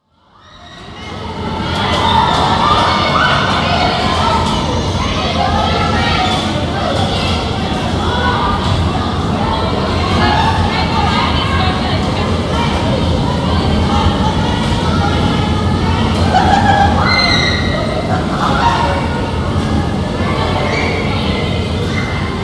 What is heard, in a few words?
Amusement Park